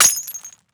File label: Crash Bottle Break; Crashes